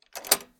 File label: door bolt